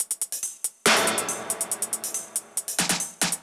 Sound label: Beat - Hats & Snare, 140bpm; Zero-G Sound Sense Grime Scene; GS Construction Kits; Just Flex